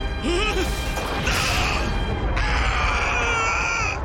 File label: scream; zeta gundam